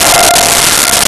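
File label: Distortion Guitar F6; Nintendo; July 2020 Gigaleak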